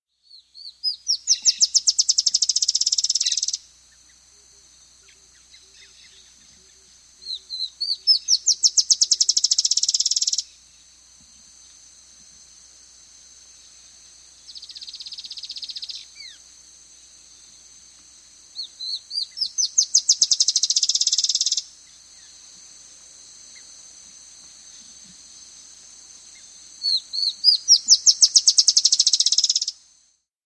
Chestnut-Shouldered Antwren, Nature's Songs, S. America